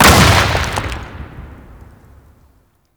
Fortress Asteroid Explosion; Ambience; Gameplay; Warframe; Grineer

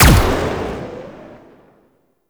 Rifle Alt Shot; Weapons; Warframe; Zariman

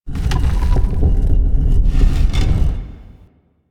Statue Bust A Grind Movements; Warframe; Duviri

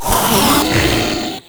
Proto Infested Ancient Vocal Pain; Warframe

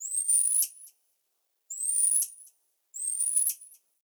Night Zone Tree Critters; Warframe; Eidolon Plains; Ambience

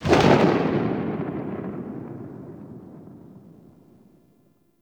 Sniper Tail; Warframe; Tenno

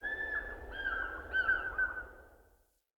Prairie Day Distant Bird

